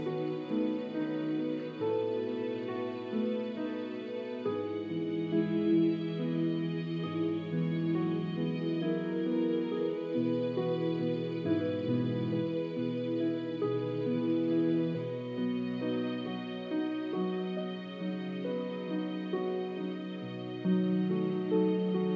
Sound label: early strings